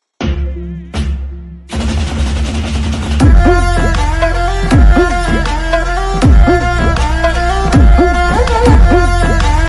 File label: dhol message dhol notification